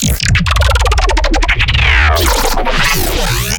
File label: glitch stab; Virtual Riot; Glitch Stabs